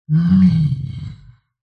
horror zombie monster or creature soft groaning growl; zapsplat